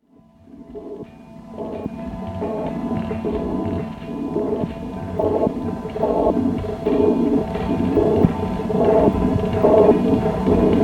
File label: Victorian Machine Music